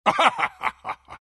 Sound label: bearform laugh; dota; lone druid